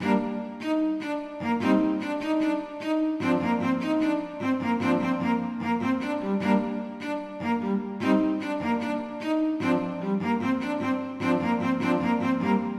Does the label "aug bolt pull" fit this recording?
No